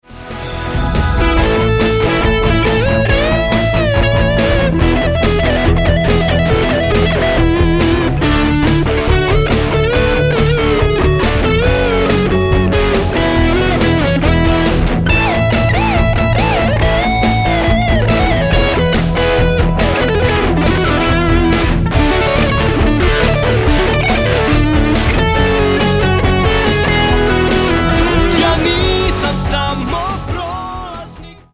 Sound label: trial solo